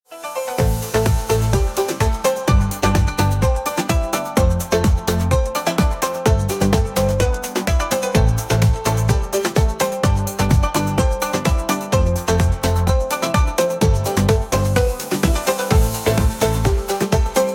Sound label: Tropical Ambiance, ringtones; Tecno Spark 40C